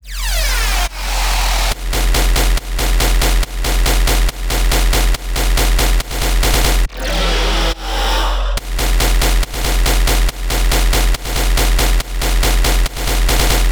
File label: deathstep bass loop sidechained; looperman